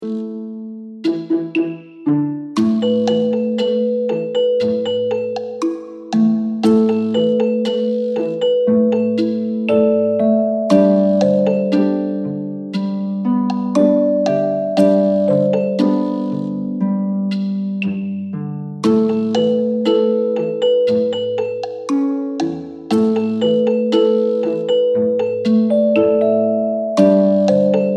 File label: Lullaby